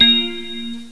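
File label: scroll ding; My Science Fair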